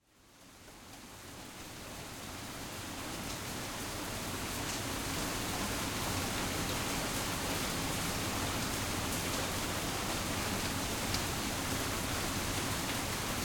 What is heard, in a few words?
RAIN Rain at Night Slow Crescendo Calm Suburban; Bolt; Backyard Rain & Thunder - Suburban Rain Recordings